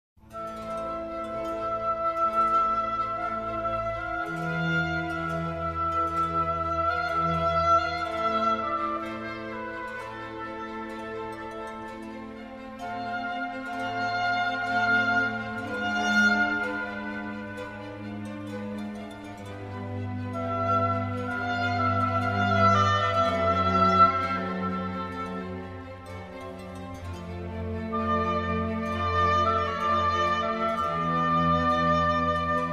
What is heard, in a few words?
Concerto for Oboe in C major; RV452; Antonio Vivaldi